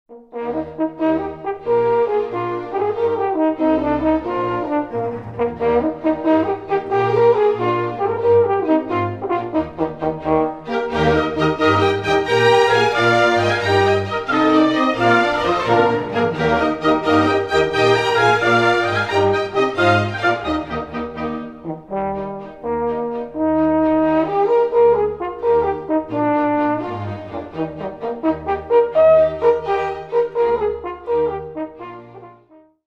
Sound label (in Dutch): hoorn